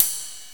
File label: ride cymbal; GMPlay; Drums; TG300B